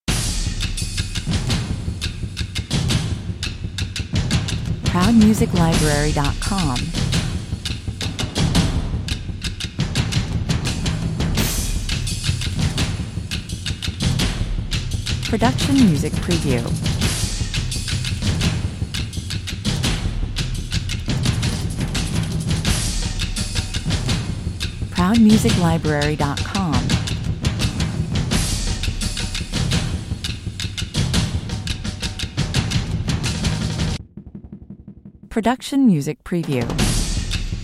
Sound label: percussion only